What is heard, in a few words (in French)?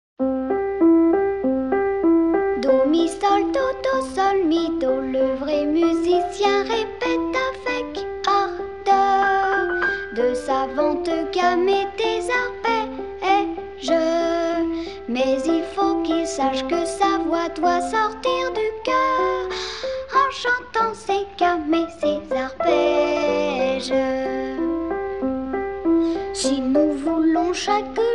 Aristochats